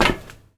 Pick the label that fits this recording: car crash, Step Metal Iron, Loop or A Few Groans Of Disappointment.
Step Metal Iron